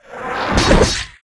war attack; Gramble

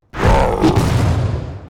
attack; CRAWLERMAUL; Mob

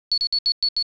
War idle; PACHI; Mob

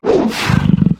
CANNIBAL ATTACK

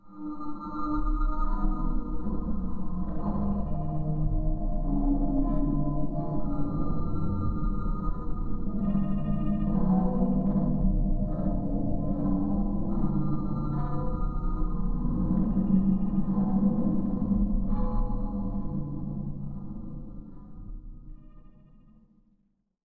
stone ice, ambience

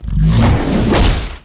Attack; Eldcrochi; Mob

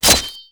Sword Scale, Damage

